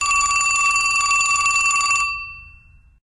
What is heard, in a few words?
Vintage Telephone, ringtones; LG Vortex VS660